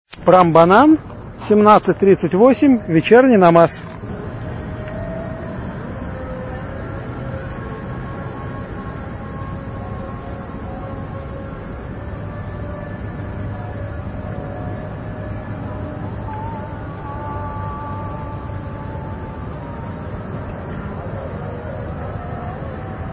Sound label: indonesia sounds; geopoesia